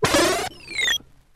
space noises, Zaps and Beeps

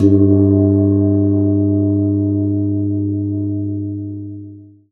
sax; Notes; Winds

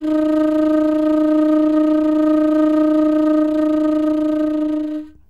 flute; Winds

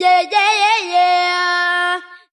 "yeah yeah yeah yeah" SUNG; ooh ahh

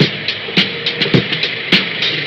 hissing cars, Drum loops

